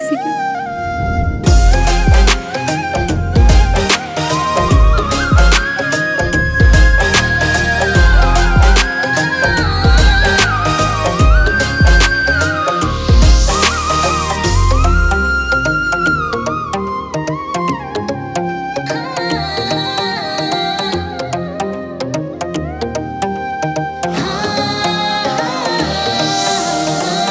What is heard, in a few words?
Instrumental